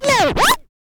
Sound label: scratch kit, vinyl; Masterbits; Vinyl Attack